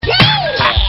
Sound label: WOOAH